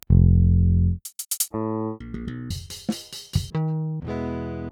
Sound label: Pultec; Virtual Analog